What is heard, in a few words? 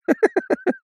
human male middle aged laugh short; zapsplat